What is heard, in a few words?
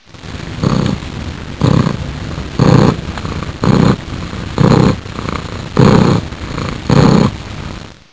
cat purr